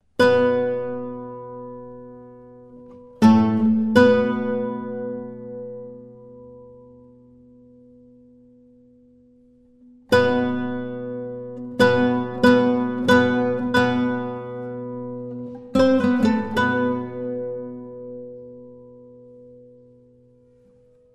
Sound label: guitar duo; Intermedio